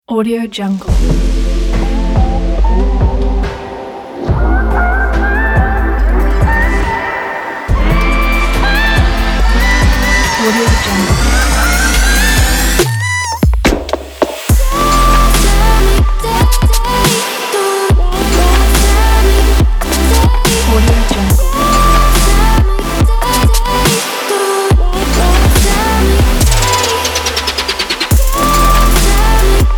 the dubstep future bass

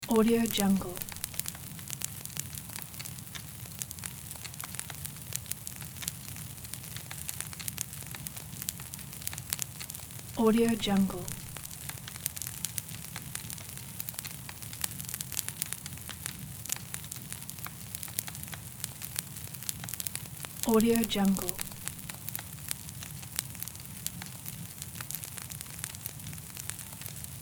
small bonfire

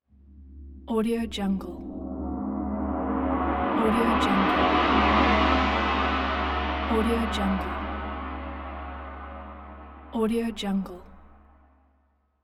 gong large

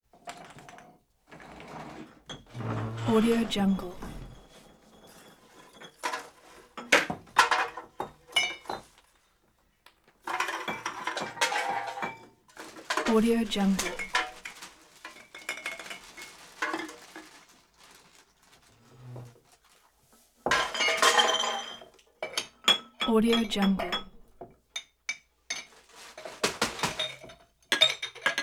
kitchen rummage

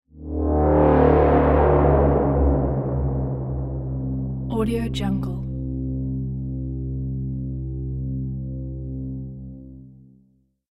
cosmic horn

